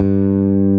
bass

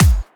kick

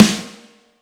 drum hit clap; osu

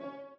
b piano

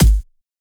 soft hit normal

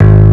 bass; osu

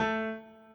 piano intro

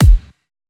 kick

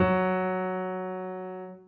F#4 Gb4, Piano

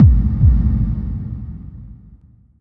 Bass Kick Reverb

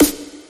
future snare; osu

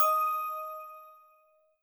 bell

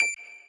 lead d

